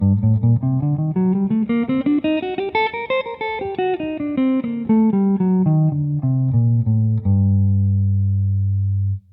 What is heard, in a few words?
G harmo, scales